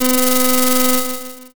slot spin

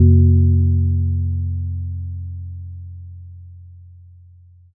gong

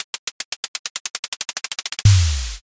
drumroll

